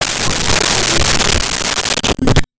Space Ship Fly By, Science Fiction

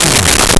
Radio Hit Descent; Transitional Elements

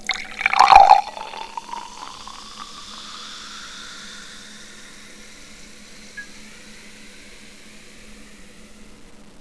cola, Water

